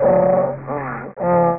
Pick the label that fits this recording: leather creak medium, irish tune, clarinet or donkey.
donkey